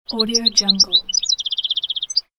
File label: meadow bird chirp isolated